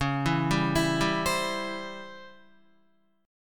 Db Minor Major 7th Flat 5th Db down Guitar; DbmM7b5 arpeggiated; JGuitar

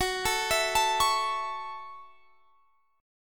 Gb Minor 7th, Guitar Standard; arpeggiated; JGuitar

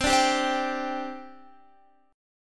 C Suspended 2nd C Guitar; strummed; Csus2; 4E,4A,5D,5G,5C,6F

